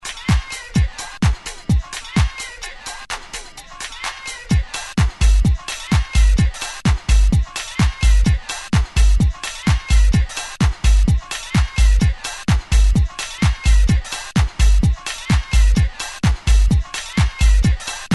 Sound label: Club swing